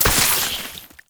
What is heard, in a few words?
Oraxia Brood Spider Web Detach; Warframe